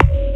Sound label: Kick; Ableton Live 12 Lite; Core Library; Drums